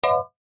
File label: wrong